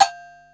agogo lo tap, Yamaha Motif ES drum machine; 300 Drum Machines; Yamaha Motif ES-Series

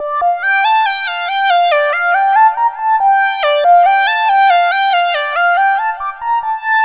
Lead, Synth, Leads

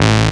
DR. LOOP, BUZZ; X-Static Goldmine 3

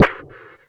Percussive Hit, contact mic

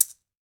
MEXICAN, Latin Shakers

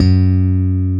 5STR BASS F#2, Bass Guitar; AKAI S6000 CD-ROM - Volume 1

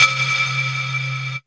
DRMSND, Pure Electro, BEATKIT

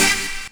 splash, synth

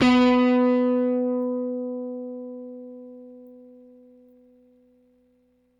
Rickenbacker Bass; Airwindows

